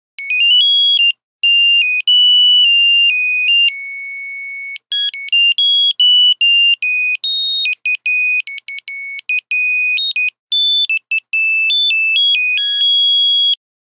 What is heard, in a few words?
Nokia Buzzer Bossanova; Windows Phone apps